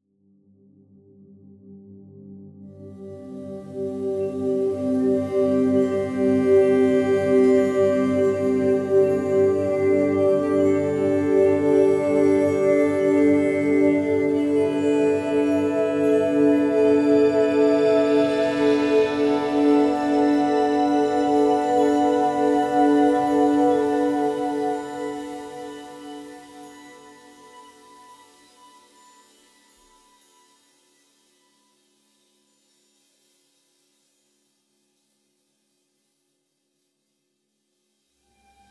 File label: Magic moment Beautiful With chime